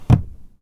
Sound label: Drawers close; Phasmophobia